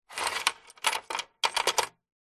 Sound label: foley money coins handling movements in plastic cash register till drawer; zapsplat